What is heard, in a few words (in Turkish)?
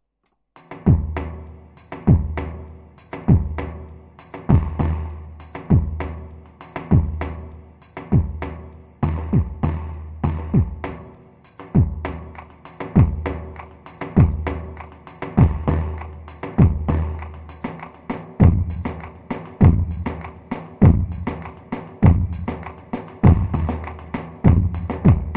pa 500 tr elazig dik halay, korg karisik ritimler